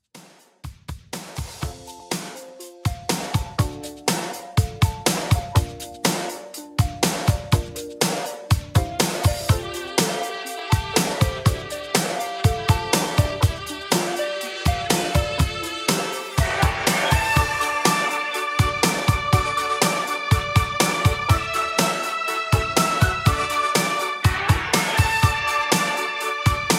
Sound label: New Wave Classic 80s 122 BPM